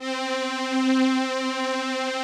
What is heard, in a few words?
VP-330, String Machines